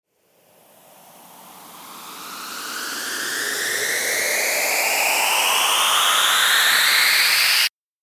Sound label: RISER